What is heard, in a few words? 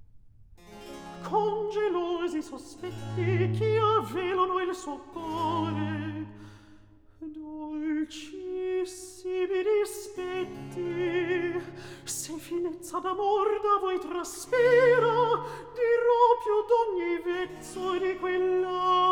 Recit; Act II; Con gelosi sospetti; Handel; Ottone, re di Germania; Ottone, HWV 15